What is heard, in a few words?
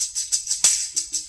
tambourine